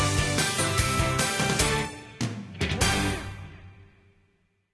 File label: Victory Sting; Vampirina